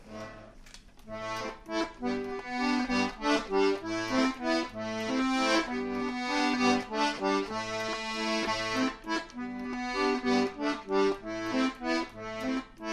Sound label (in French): valse, accordion; accordion experiment 2003-2006